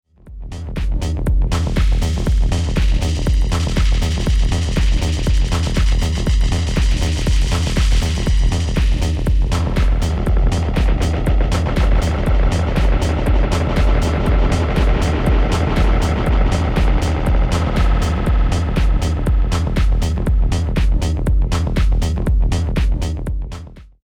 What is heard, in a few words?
PLX 500 Dub Delay